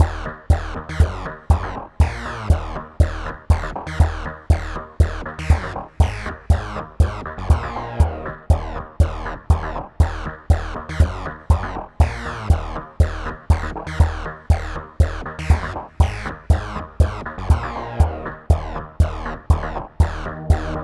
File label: nitron